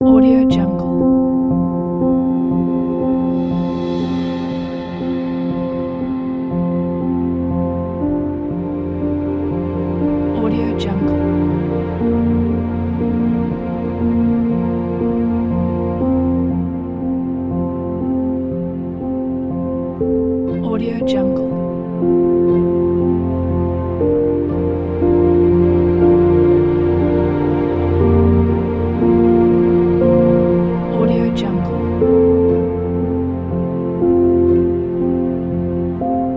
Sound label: piano waves